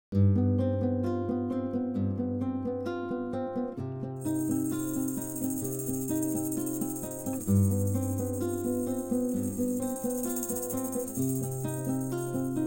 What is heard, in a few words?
no bass